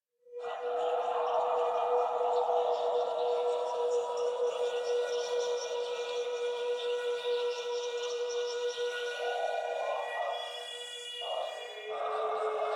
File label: Sentient Choir; Warframe; DE; Sentients